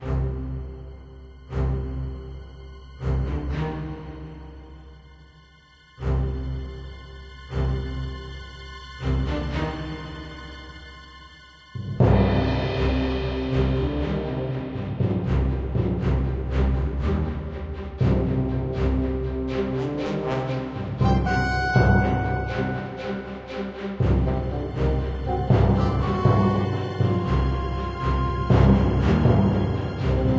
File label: dungeon, music; Undercroft